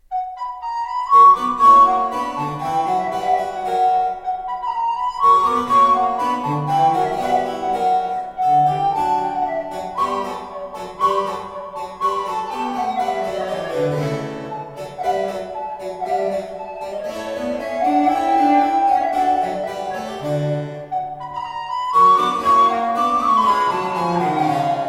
Sonata IV In C Minor Gavotta allegro, Recorder and Basso Continuo; Francesco Barsanti; Sonatas for Recorder and Basso Continuo